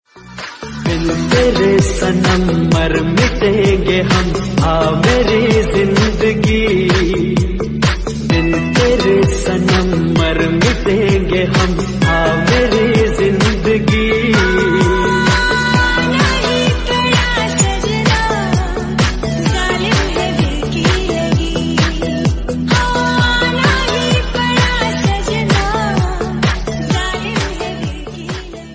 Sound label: Remix Ringtone